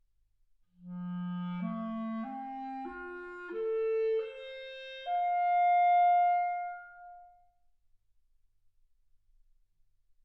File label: clarinet